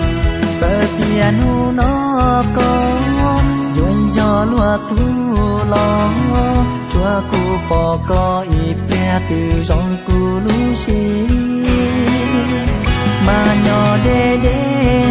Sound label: Hmong Love Song